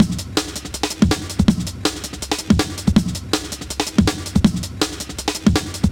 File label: 162, breaks; M8